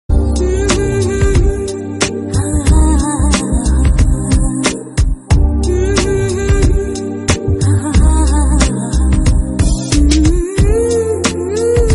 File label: romantic ringtone